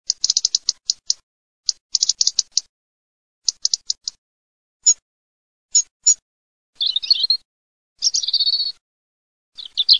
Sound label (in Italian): PETTIROSSO, birdsound